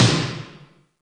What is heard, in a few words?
Snare, Alesis D4fx